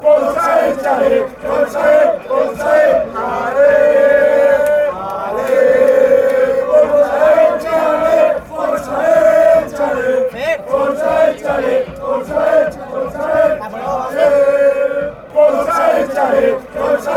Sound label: ale come on, fan chants; elche